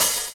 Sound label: Open Hhat, Hihat; Equipped Music; Loungin House